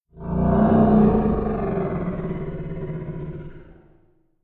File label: halloween monster growl